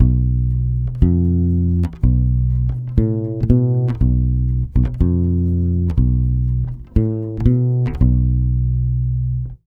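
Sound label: 120 bass B diminished, brazil bossa; USB Soundscan; AKAI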